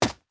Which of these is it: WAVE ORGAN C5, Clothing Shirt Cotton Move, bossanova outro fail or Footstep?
Footstep